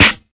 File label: sparkle acoustic snare c sharp key; snare drum samples